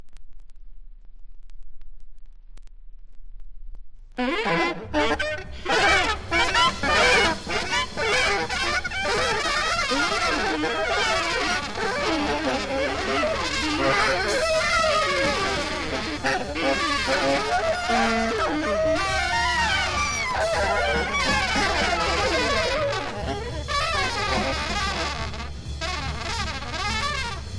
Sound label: Bells